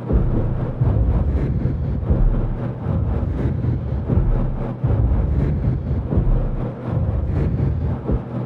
submarines engines; World Of Warships

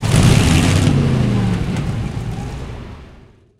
maus engine load; War Thunder

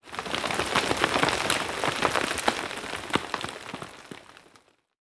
impacts; World of Tanks

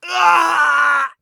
scav death, voice; Escape from Tarkov; scav4

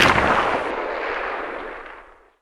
Inf Weapons; Red Orchestra 41-45

